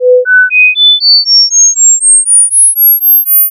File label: Bark Demo; 1000-Hz increments